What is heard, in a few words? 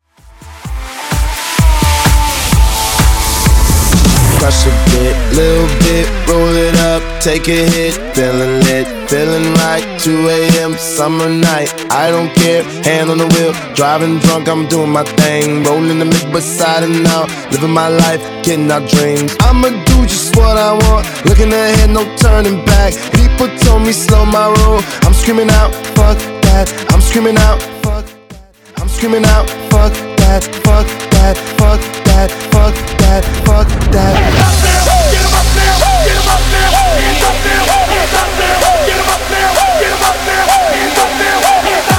Afro House 128 bpm